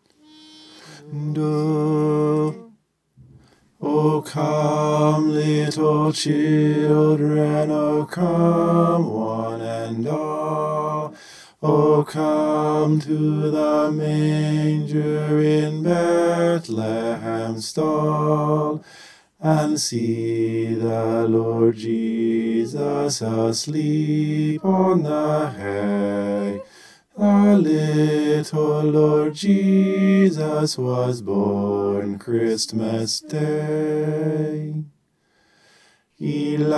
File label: bass